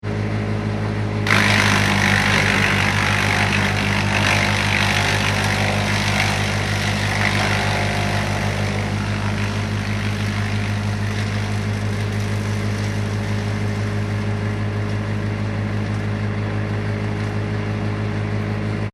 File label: industrial machine wood chipper vermeer bc150; Wood Chipper Operating ( Vermeer Bc150 ); Machinery; Machines; Wood Chipper Operates; McKinney Sound